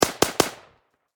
Warfare Machine Gun 7.62 Caliber Ak 47 Triple Shot Distant Perspective; SFX Bible 6